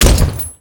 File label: Ghoul Devourer Step Default, Footsteps; Warframe; Grineer Ghouls